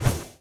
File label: Hatchling Charge; gamerip